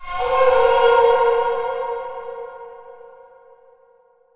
secret area; svencoop; underrain

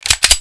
m16 charge, weapons; svencoop

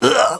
pain, male

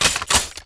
pkm cock; firearms

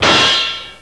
metal; gearbox; debris

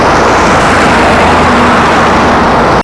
car level sounds; svencoop; amckern

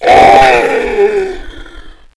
zombie pain

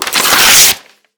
tear; svencoop

